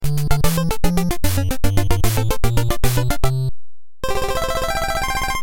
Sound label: round 100 complete; duck hunt